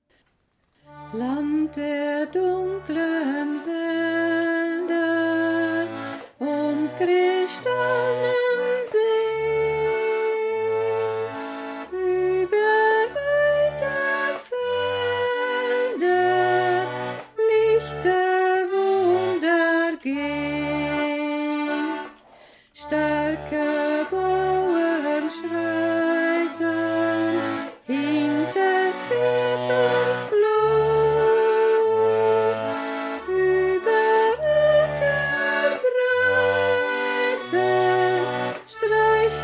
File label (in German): Land der dunklen Walder gesungen